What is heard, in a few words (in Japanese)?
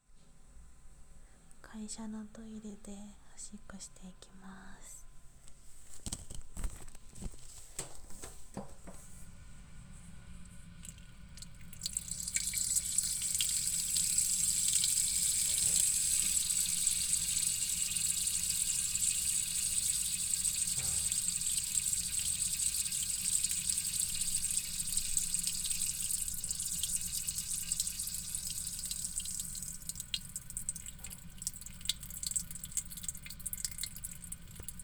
会社のトイレでオシッコ; １回目！